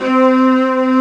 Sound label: viola